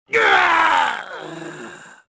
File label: death; Star Wars Jedi Knight II Jedi Outcast; XBOX; bespincop